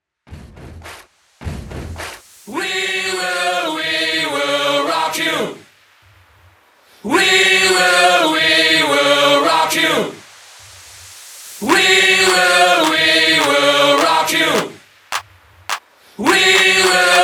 Epic HipHop, 105 BPM

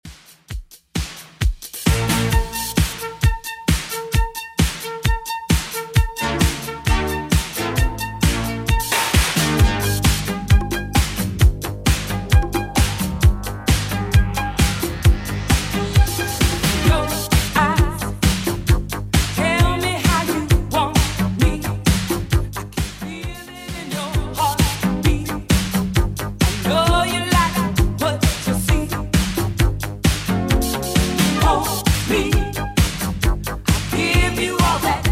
80s Redrum 132bpm

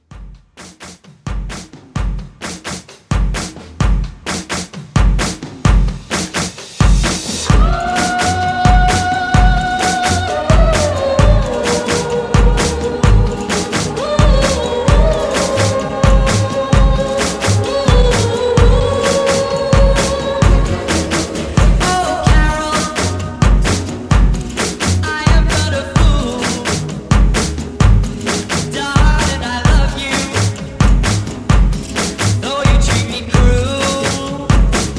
50s Pop Re Drum 130

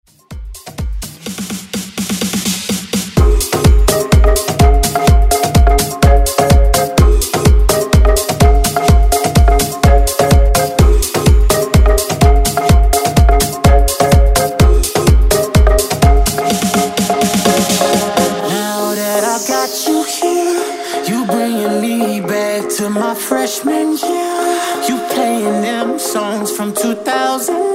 Afrobeat Redrum

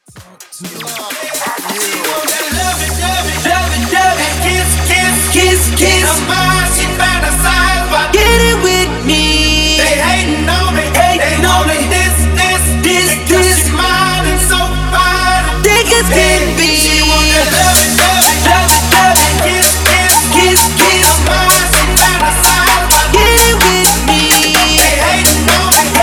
Mashup 128 BPM

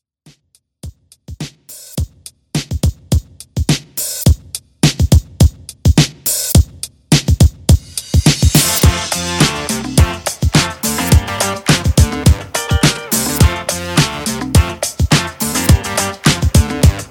ReDrum 105Bpm; remixplanet

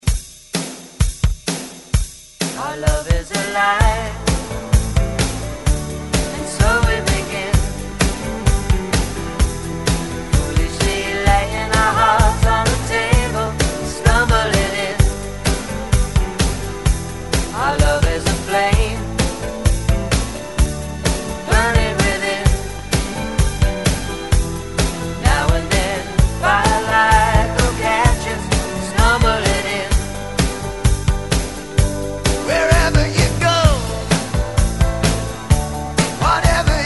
70 Re Drum 129 Bpm; DJDOLLS

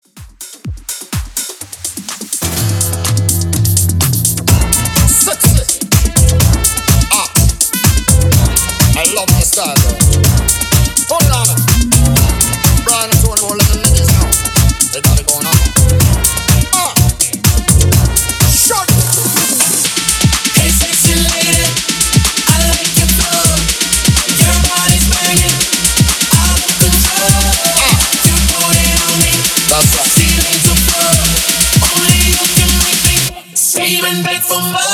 Flip Dance Edit 125 BPM

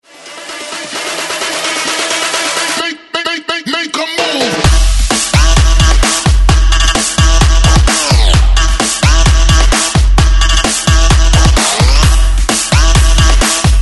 130 BREAKMIX

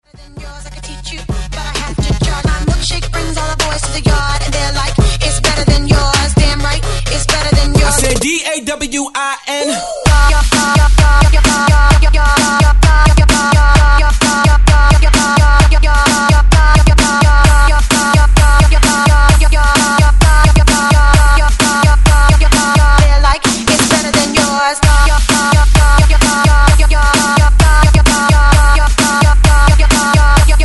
130 break mix; DJDOLLS

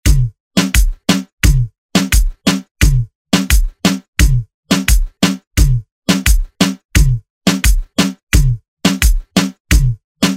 Reggaeton Redrum

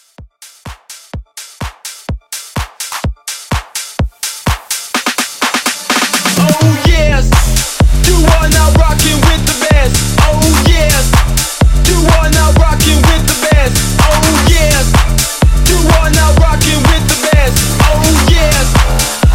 House Mixshow Edit 126

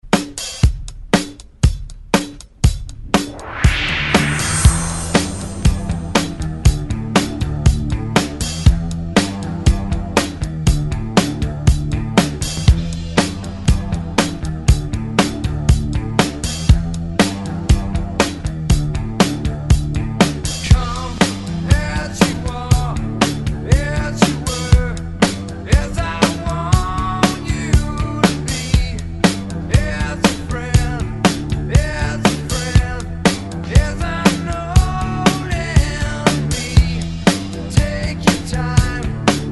Alternative Rock 119Bpm; DJDOLLS

